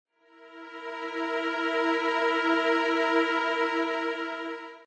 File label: fear